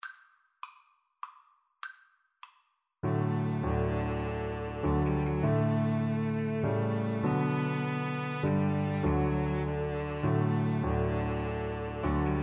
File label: amazing grace, Flute Cello Duet